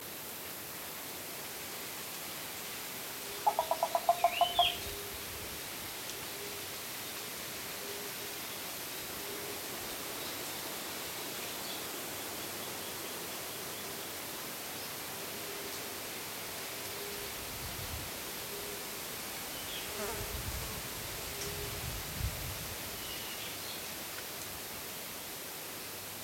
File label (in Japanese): harabuchigaeru